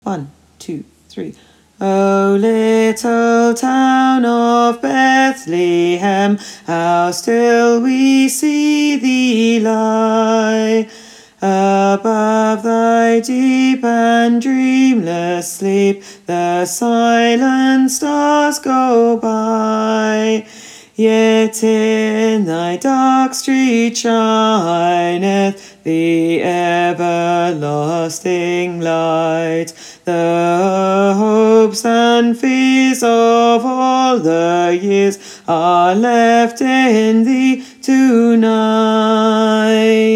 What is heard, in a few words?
Tenor